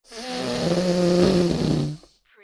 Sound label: Whoopee Farting Blowing Raspberry Sound; beanstalk audio